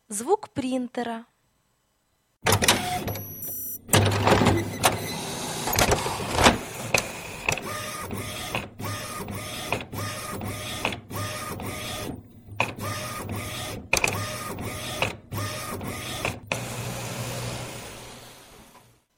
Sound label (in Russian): Звук принтера